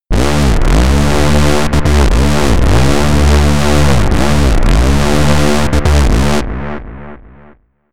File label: tumble and rise again high; tonal-melodisch